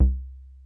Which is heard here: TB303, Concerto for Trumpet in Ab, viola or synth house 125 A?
TB303